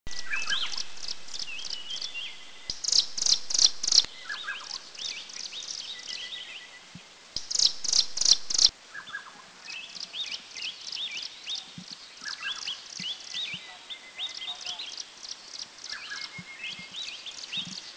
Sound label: Striated Prinia Anmashan; Prinia crinigera striata; Taiwan